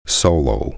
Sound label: solo